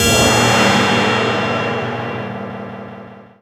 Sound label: F X, PERC FX